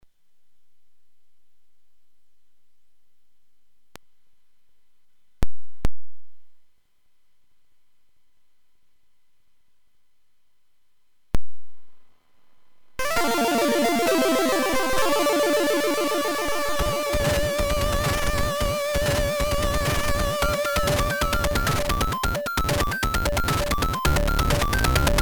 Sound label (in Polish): hardware chiptune brzydko bezposrednio do line in